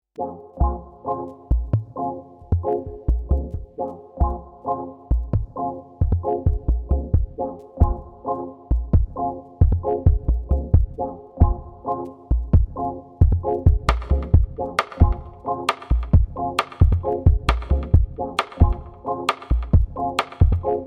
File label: Beat